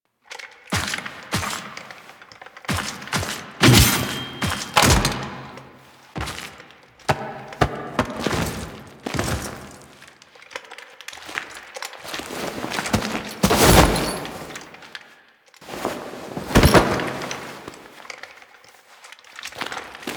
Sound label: Pod Check Foley; Warframe; The New War; Grineer Pod Check